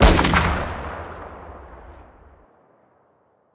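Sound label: weapon br2 fire player atmo ext; gmod; viper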